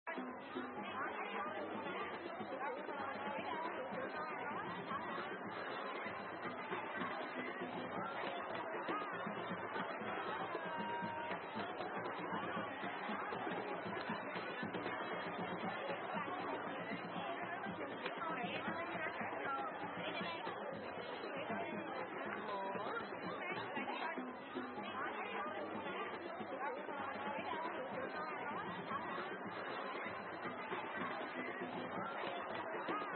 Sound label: ambience location